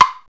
pop; Wired for Sound Pro CD; Aristosoft, Inc.; pops

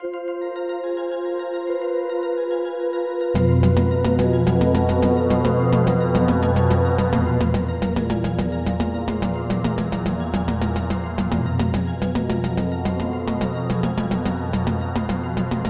M GONG; congo

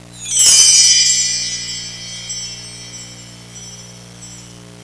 CHIMES, electron; Easy Personal Web Page

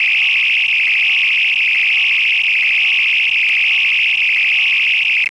Phaser; Star Trek

